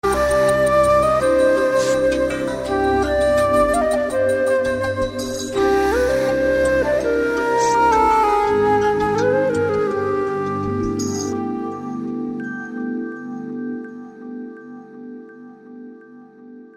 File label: Flute